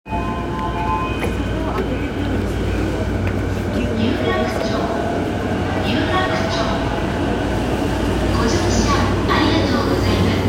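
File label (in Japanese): 山手線 有楽町 counterclockwise, train sounds; JR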